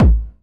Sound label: Hard Kick